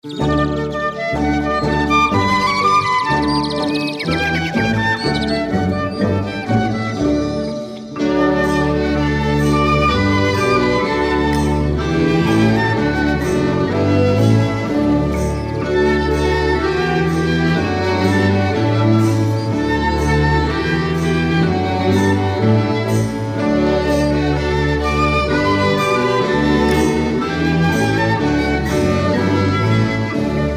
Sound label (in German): Alle Voegel sind schon da Karaoke